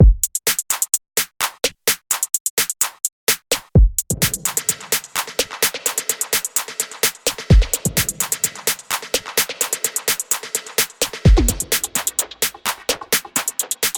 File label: Presets On Drum Loop